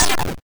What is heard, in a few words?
lazer shoot